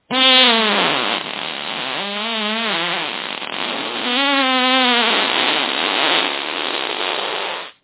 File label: farts